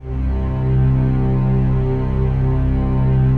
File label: PAD, DIGI-PADS